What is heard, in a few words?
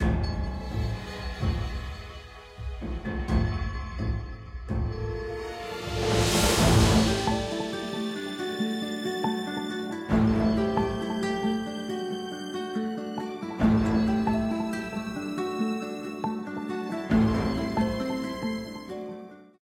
Timeline BGM; AFK Journey; Android